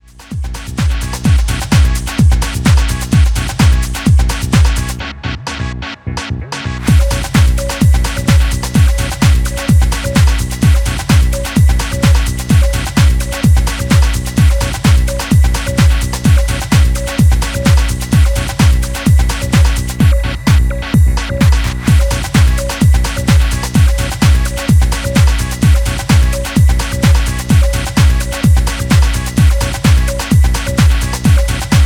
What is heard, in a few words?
Instrumental